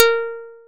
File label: plucked, programmable speaker